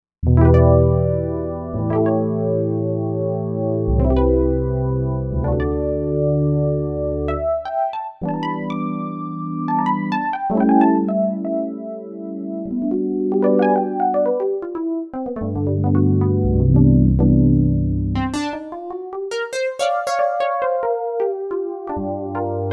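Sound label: Pulse Electric Piano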